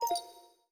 Speech Sleep; Windows 7 Sounds

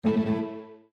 Windows Hardware Fail; Windows 7 Sounds; Sonata

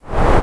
Windows XP Restore; Windows XP Sounds; Amplified

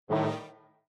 Windows Critical Stop; Windows 7 Sounds; Landscape

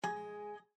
Windows Information Bar; Windows 7 Sounds; Delta

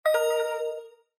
Windows Notify; Windows 7 Sounds; Characters

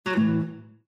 Windows Hardware Remove; Windows 7 Sounds; Heritage

